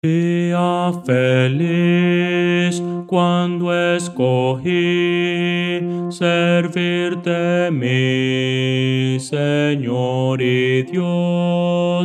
bajo, himnos cristianos; dia feliz cuando escogi

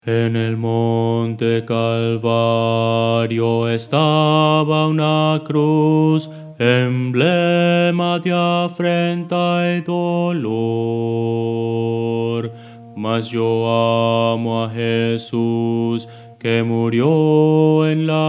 bajo

